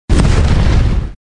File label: Sfx Explosion; Sfx Baphomet Explosion